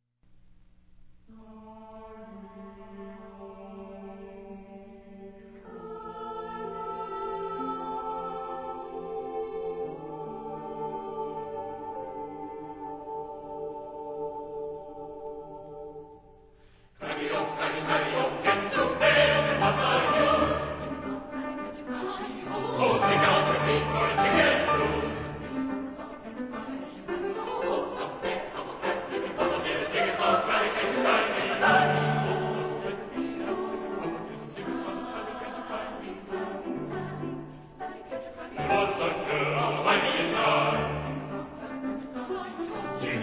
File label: Six Canadian Folk Songs; The Festival Singers of Canada, conductor Elmer Iseler; CBC; Derek Healey